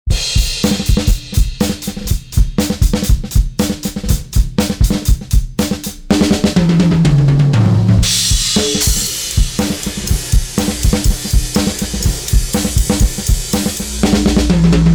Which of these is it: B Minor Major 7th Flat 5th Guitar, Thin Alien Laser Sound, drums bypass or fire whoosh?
drums bypass